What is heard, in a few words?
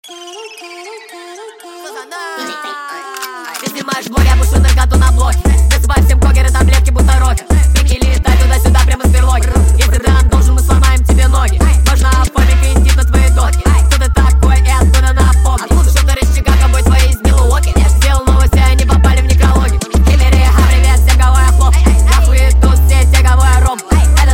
speed up Low Bass nightcore